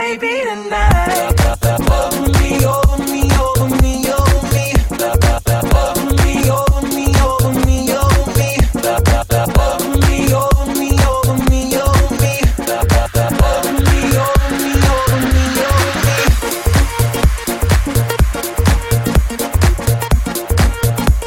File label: love beat remix